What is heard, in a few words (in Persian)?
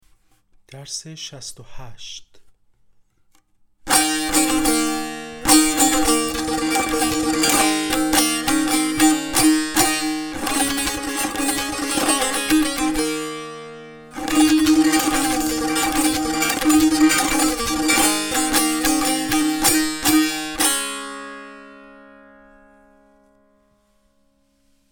مشق تنبور